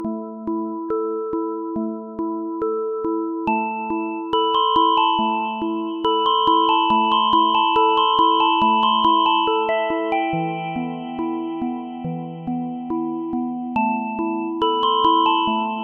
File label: space melody ringtone